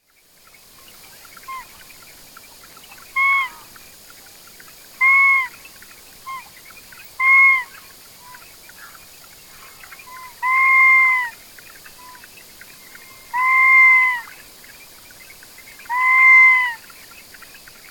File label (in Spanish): huala, aves; lampa